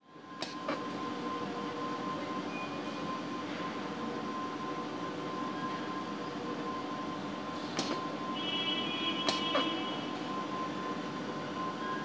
tyre factory floor factory ambience tyre batch wrapping unit close up pneumatic decompression